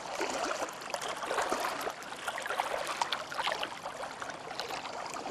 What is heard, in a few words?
amb dock water; angel city; Titanfall 2